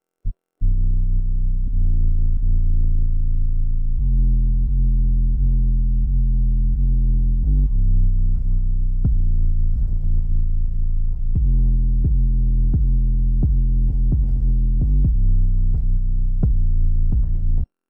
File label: bass, separated; htdemucs